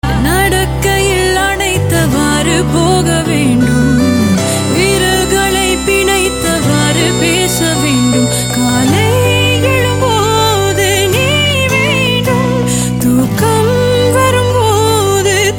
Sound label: female ringtone